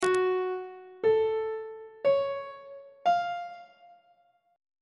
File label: f sharp minor major 7th chord intervals on treble clef